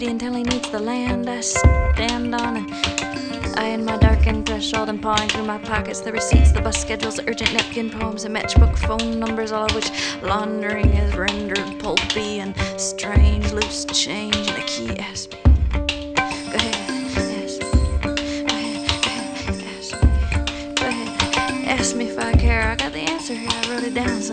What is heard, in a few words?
rock